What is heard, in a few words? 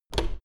door plastic handle pull down hard